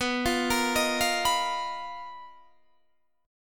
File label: B Major 7th Flat 5th B down Guitar DADGAD